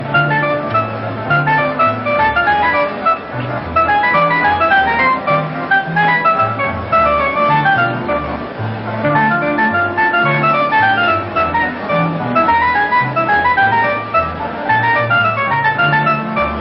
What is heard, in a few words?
music bed; sounder